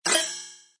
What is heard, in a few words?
refresh; effects